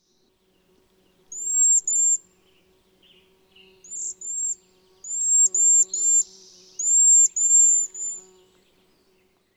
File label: Cedar Waxwing, birdsong; June 14, 2009. William L. Finley National Wildlife Refuge, Corvallis, Oregon.